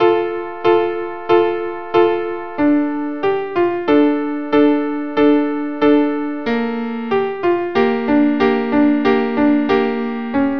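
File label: viola viola; Hebrew Melody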